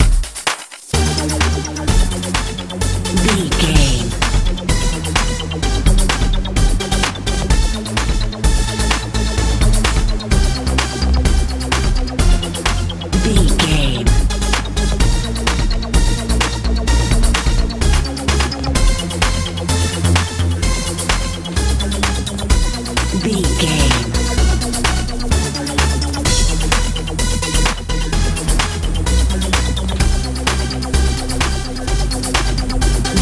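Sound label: euro dance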